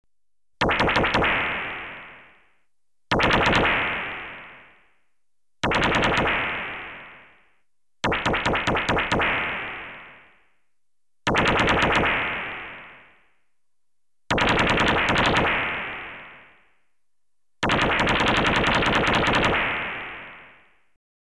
Stun Gun (Series Of Bursts), Electronically Generated Sounds; BBC Sound Effects Library